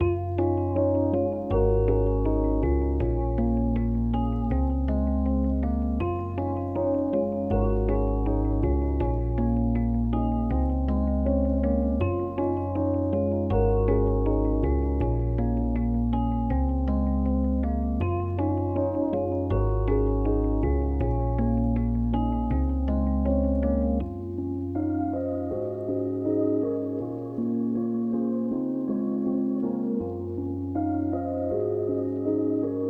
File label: 80 BPM F# Min, Melodies, Lofi; Cymatics; GHOST (Beta Pack); Blue Wish